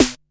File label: SN, SNARES; USB Soundscan vol.20; Fresh Disco House I; AKAI